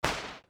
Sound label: lightning short